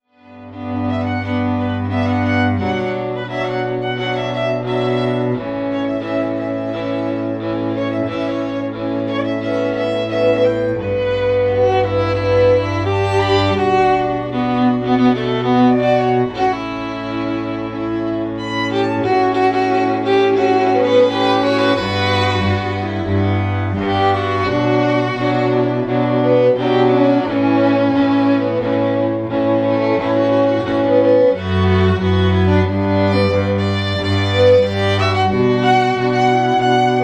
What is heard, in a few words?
strings